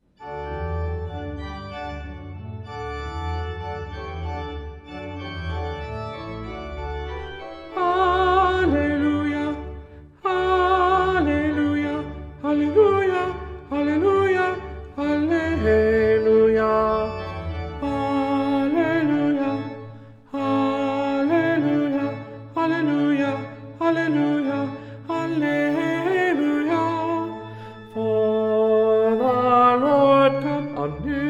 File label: Hallelujah Chorus Tenor; Hallelujah 2020